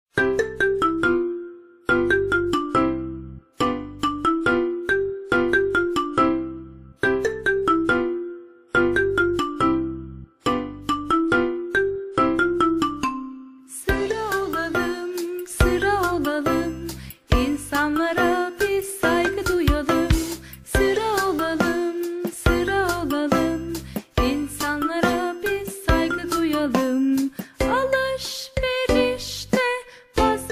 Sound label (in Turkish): Sıra Olalım İsimli Okul Öncesi Eğitim İçin Eğitici Şarkı